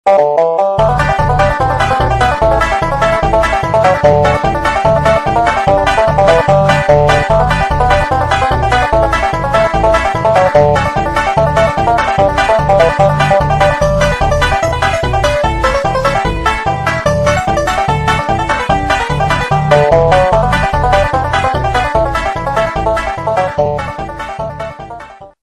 salty dog blues

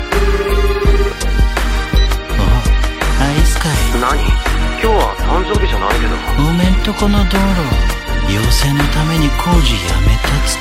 norwegian calling tune

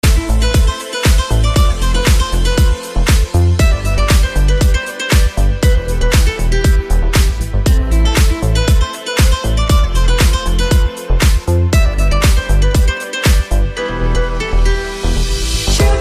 classical music

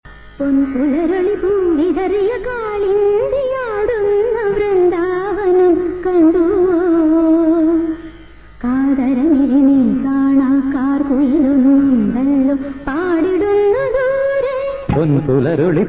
malayalam tune, ringtone

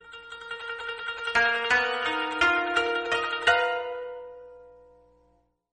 japanese instrumental

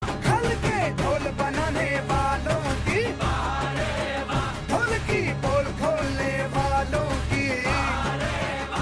dhol

